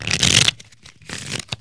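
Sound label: casino ambience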